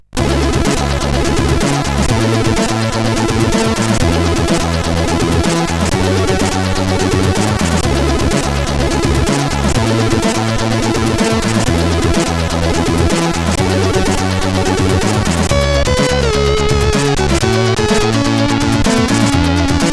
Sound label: Intro Sound, sid, CSG8580R5